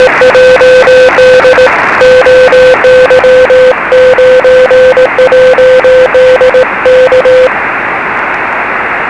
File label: 23cm call cq cw